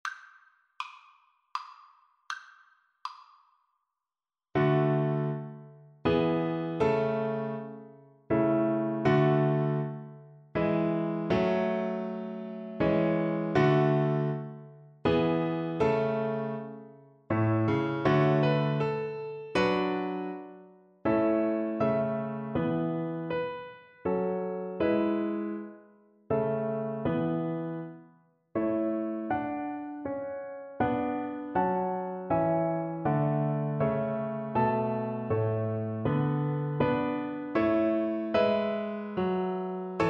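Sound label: viola karaoke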